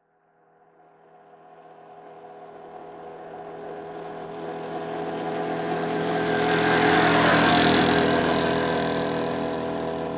tunturi external pass by; sonniss gdc

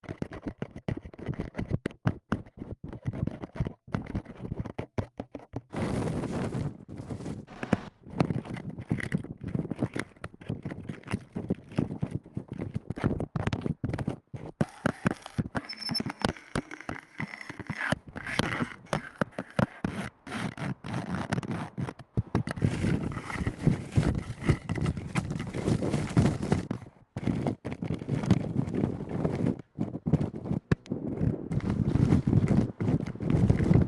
microphone movement noise collection; sonniss gdc

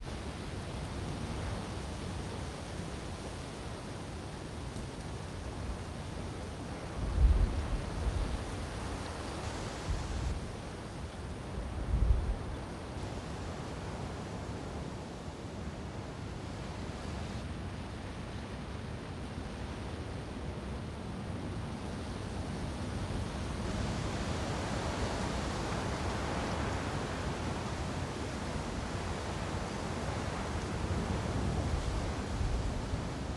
wind cypress tree canopy near field; sonniss gdc

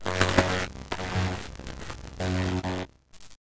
shocking grasp, cantrips

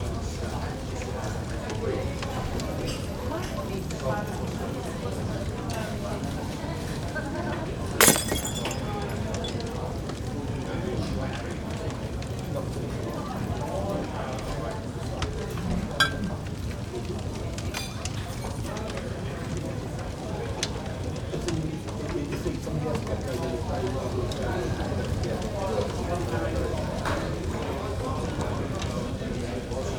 tavern sfx

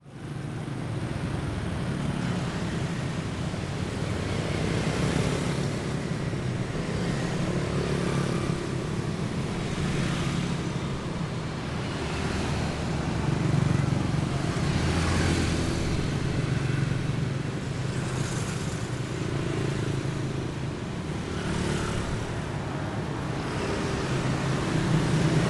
street traffic vietnam; sonniss gdc

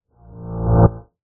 woosh; sonniss gdc

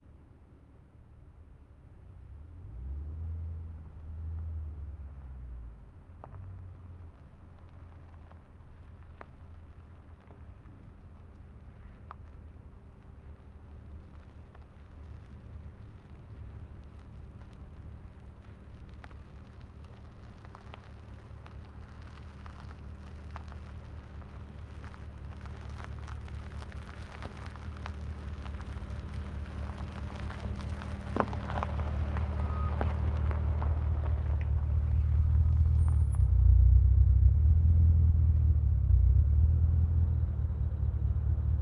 bmw x5 m boom very slow drive and approach; Sonniss GDC

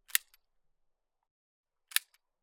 handling clearing; sonniss gdc

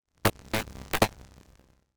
melee attack impacts magical effects lightning; psfx

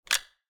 revolver prepare; ranged weapons guns; psfx

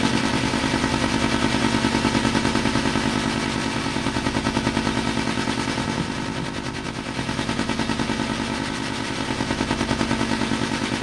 light machinery; sonniss gdc

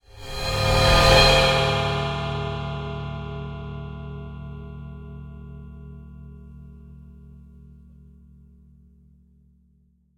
bowed cymbal 17 crash mids; fienup; sonniss gdc

